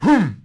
esfuerzo traidor corto; severance

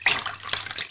desangre; Blade of Darkness

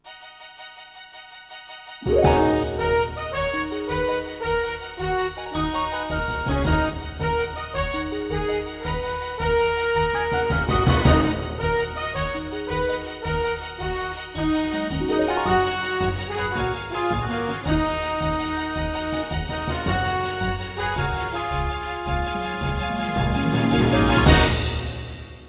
Música Final

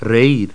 Reir; Spanish Net; BEACH

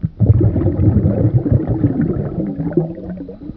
Muerte Agua; Revista CD Expert 42; Grounch

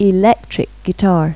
E GUITARR; Pipo-Aprende musica con Pipo

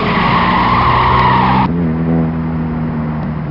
derrape; rally1

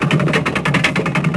rastrillo; Severance

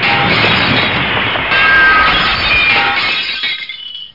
choque; rally1

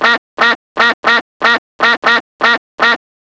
patitos; Pipo-Aprende musica con Pipo

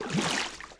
Agua Plas; Grounch

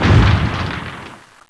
Cata Hit; Grounch

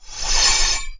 Metalico; Grounch

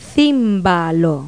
CIMBALO; Pipo-Aprende musica con Pipo

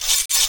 cambia arma; Grounch

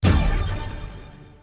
fuerza

